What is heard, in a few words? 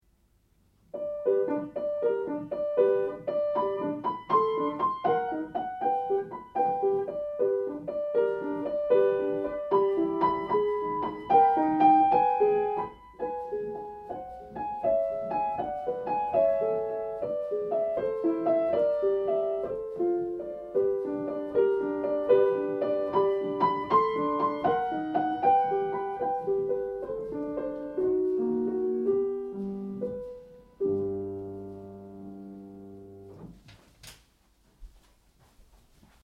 waltz